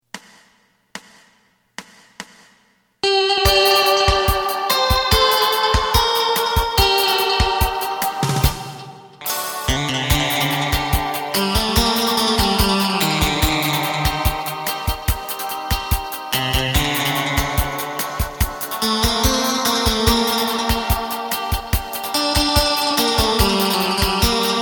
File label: bass